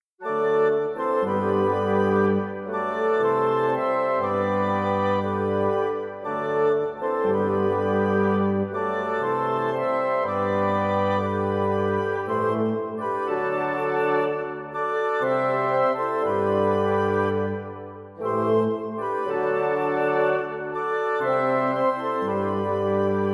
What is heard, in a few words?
clocks; Zannetti Clocks; People of Oman (in honour to); His Majesty Sultan Qaboos bin Said